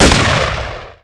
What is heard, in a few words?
Weapons Rifle Fire; Deus Ex Demo; DeusExSounds